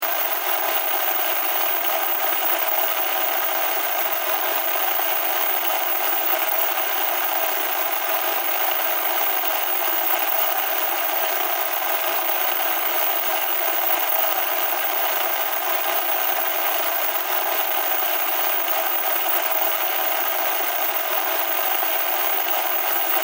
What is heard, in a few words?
Technology Television Static; Electronics